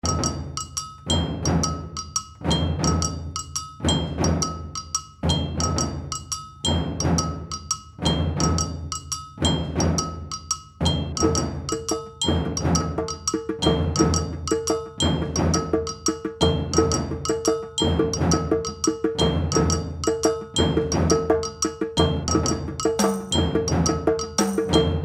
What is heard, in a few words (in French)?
Percu